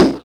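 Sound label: SD, snare drum; X-Static Goldmine 1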